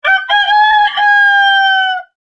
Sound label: chicken cry